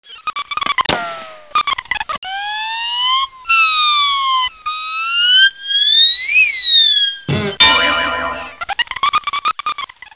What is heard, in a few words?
GOPHERS; T.J. Finds a Friend